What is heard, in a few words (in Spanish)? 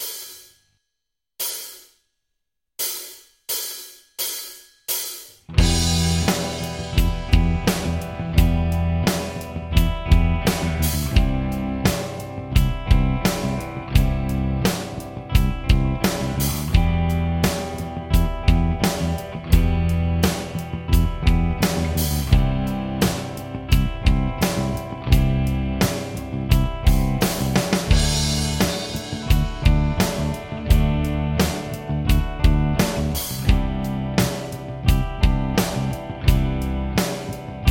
tapping en guitarra pista de acompañamiento